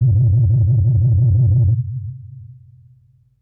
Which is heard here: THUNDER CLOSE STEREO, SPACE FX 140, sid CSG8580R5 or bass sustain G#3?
SPACE FX 140